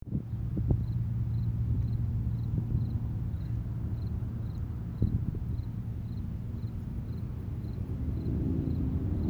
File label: faint cricket; crickets